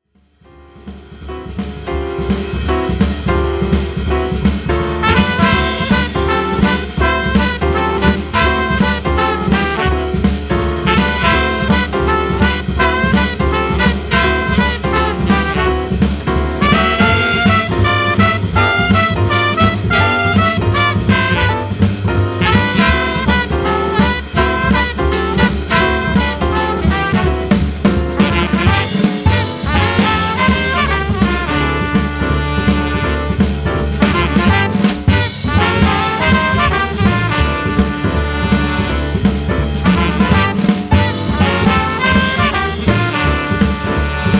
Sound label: horns